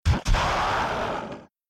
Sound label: Great Sand Shark Death